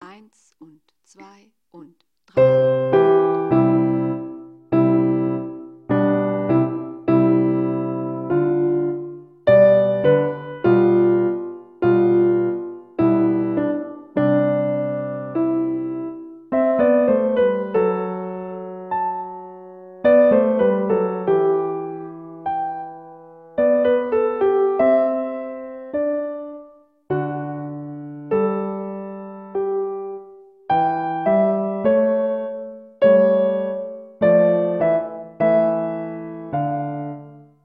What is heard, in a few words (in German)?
Menuett in C-Dur, Piano; Play Piano Klassik